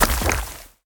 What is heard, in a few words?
sand hit; smite; Anhur